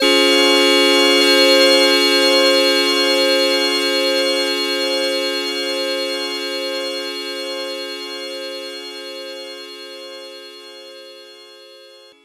C Minor Analog one shot, Synths; Sonic Foundry (Sony Creative Software); Electronic Point Blank

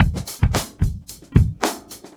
Breakbeats loop, 70_s Breakbeats; USB Soundscan vol.46; AKAI

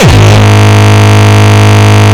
Longkick, kicks

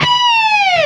Dive, Guitars

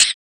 RSS, 160 BPM

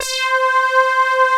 ANALOG; Pro-Rec - Synthsonic

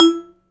short vibra, C E-3; AKAI S-Series CD-ROM Sound Library VOL-7

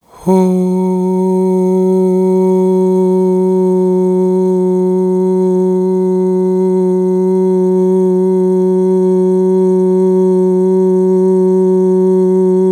AHHH, Vocal; Polyend

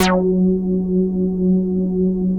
JUNO 106; Masterbits; Vintage Voltage; SEQ-FX

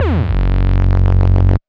Poel Bass, Bass One-Shots, Drum n Bass; KJ Sawka - Drum n Bass & Beyond

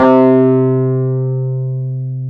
PNO, Wurly; Roland LCDP10 Keys of the 60s and 70s 2

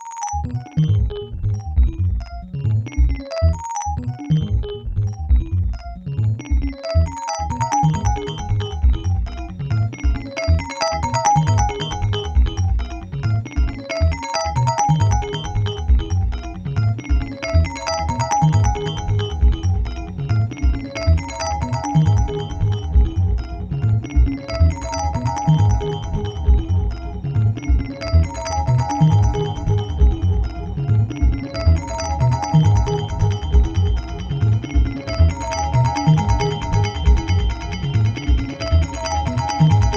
Arp Crazy G#2; Zero-G - Ghost In The Machine

